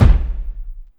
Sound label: Kick Waveplay